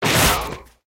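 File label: deadbook damage up, monster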